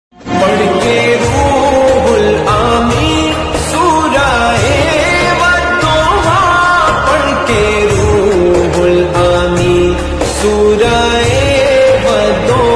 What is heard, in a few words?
surae wad duha ramzan ringtone slow reverb ringtone; jumma mubarak